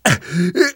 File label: choke; namvet; Left 4 Dead 2; left4dead2 dlc2